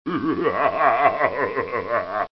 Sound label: Belly Laugh